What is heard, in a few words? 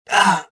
pushed, rodian